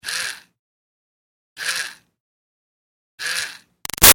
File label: Toy Fairy Wand Revs Short; Miscellaneous Toy; Sound Effects:Toys; Big Room Sound